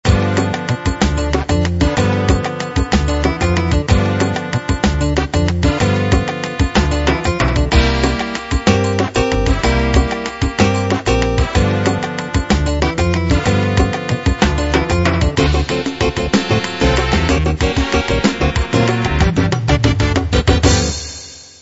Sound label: afro latin 3-4 jazz